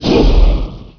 trooper attack; Emperor - Battle for Dune

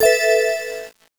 notify

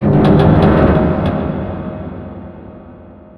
metal scrape verb; ambient; Counter-Strike Global Offensive

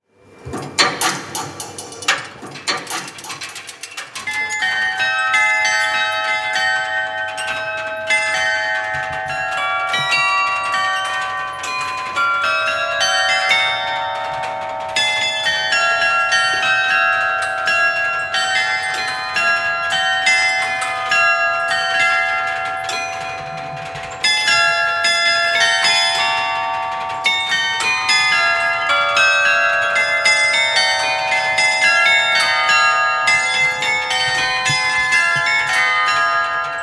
Carillion, Mechanical Instruments; 21 Mechanical Instruments at the Speelklok Museum; 'Aria from die Zauberflöte'

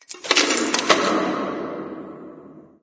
debris random, ambient

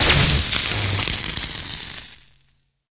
defibrillator use; weapons; Left 4 Dead 2

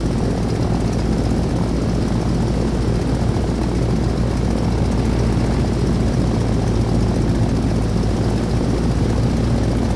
Airship Patrol; Sid Meier's Civilization 4 Complete; Beyond the Sword; Units

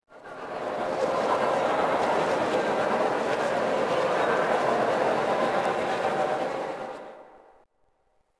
City Crowd; Sid Meier's Civilization 4 Complete; Beyond the Sword

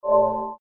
friend logout; League of Legends; lol air client

